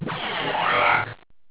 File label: shrink; warlock